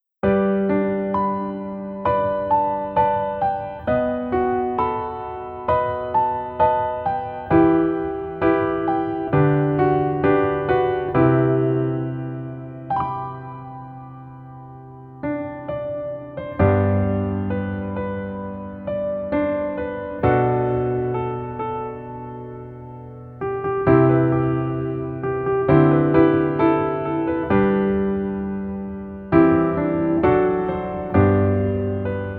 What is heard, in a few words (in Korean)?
Piano ver, 멜로디MR 여자키